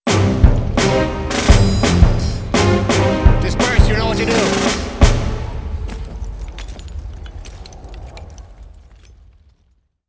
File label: allies score, ambience